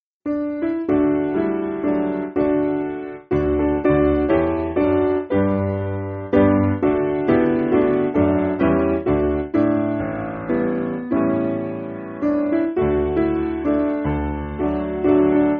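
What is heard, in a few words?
Piano, Free Music Backing Files for Churches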